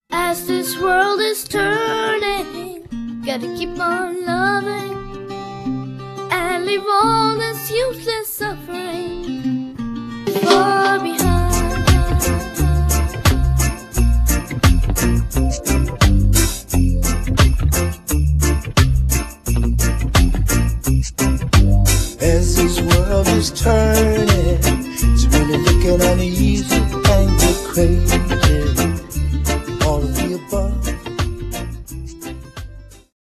reggae

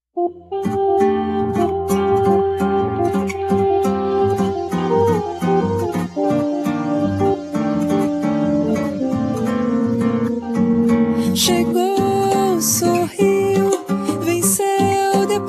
brazilian

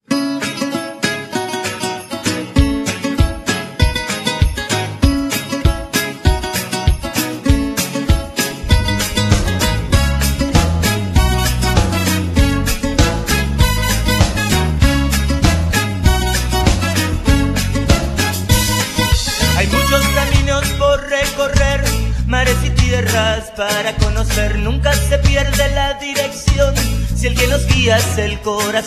folk

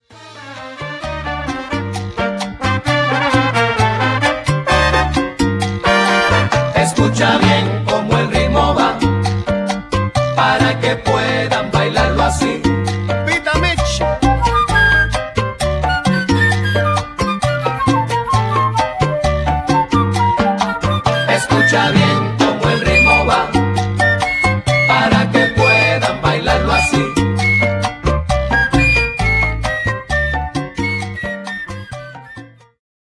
folk

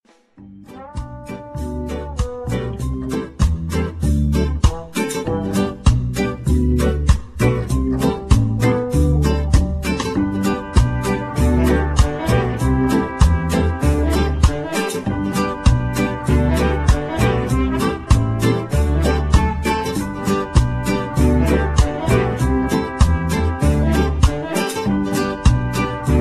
latin reggae

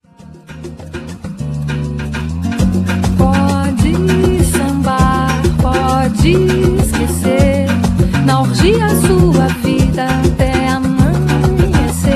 brazilian

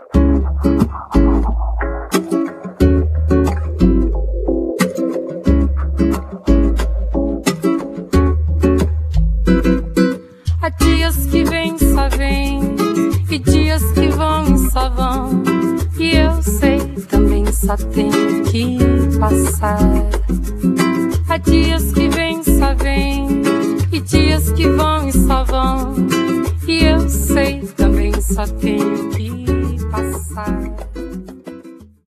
brazilian

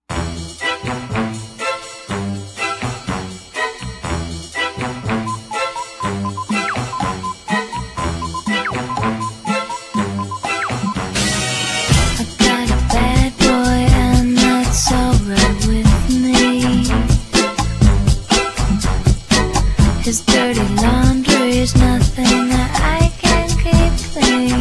groove

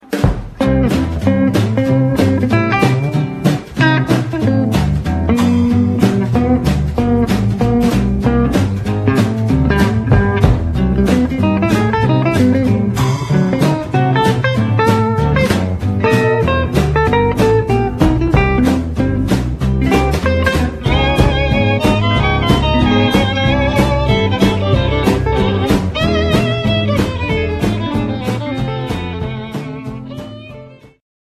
swing

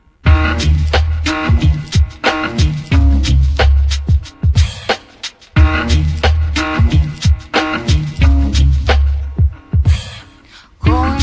groove

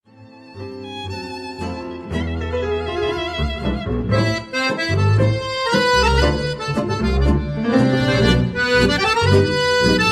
tango

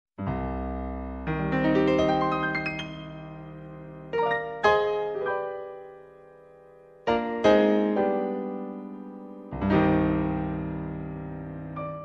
Rumba, Mambo

